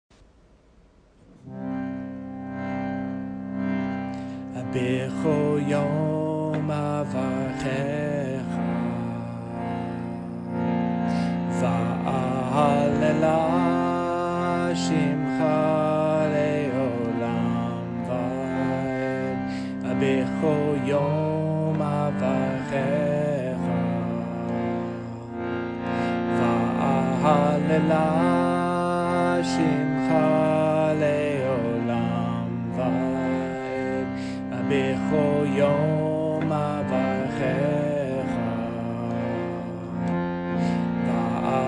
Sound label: chant; bet